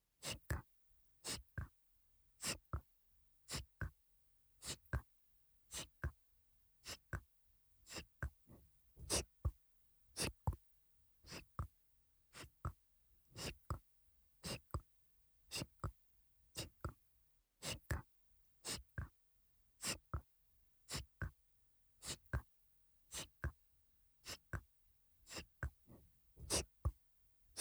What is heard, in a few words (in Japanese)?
乳首弄りループトラック編（乳首しごき用）, ASMR; 超初心者のための「3ステップ」乳首開発完全ガイド!～低刺激スローチクニ―から♀イキまで～